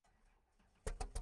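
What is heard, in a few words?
robinson r44 onboard start idle exhaust; dpa4062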